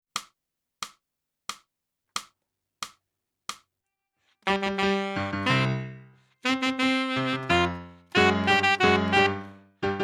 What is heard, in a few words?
sax dance with trumpets